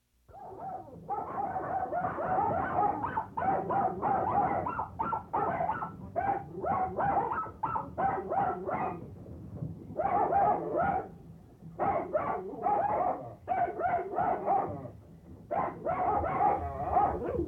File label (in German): welpen zoohandlung, Tierstimmen